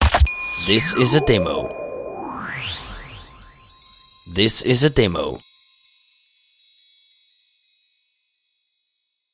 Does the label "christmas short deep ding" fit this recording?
Yes